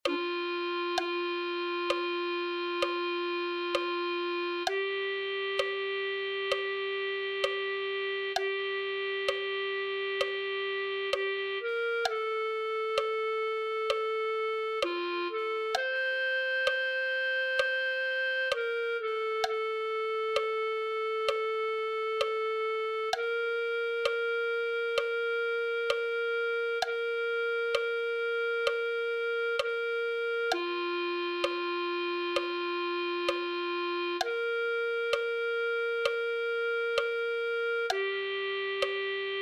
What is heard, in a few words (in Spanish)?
clarinete